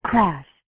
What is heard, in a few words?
crash